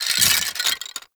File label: Bone Effigy Destroy; Gameplay; Warframe; Tau; Destructible Dynamic